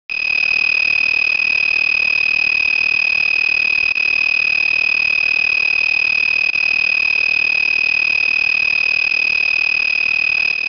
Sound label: Beepcode I2C Error